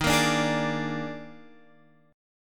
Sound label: Eb Major 7th Suspended 4th Eb down Guitar Open F; strummed